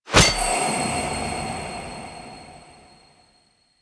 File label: Treasure Pickup Objective; UI; Pickups; Endo Treasure; Warframe